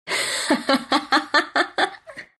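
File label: laugh, Russian; Dota 2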